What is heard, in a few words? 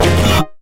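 Hit Synth, Akai MPC5000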